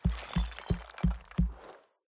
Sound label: reels fall in; hades River Of Souls Desktop; Fantasma